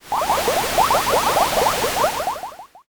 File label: boiling